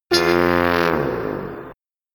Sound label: TILT; Indiana Jones The Pinball Adventure; Williams; arcade